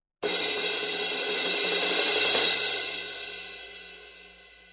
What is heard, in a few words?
crash 18, cymbal; sabian; hhx evol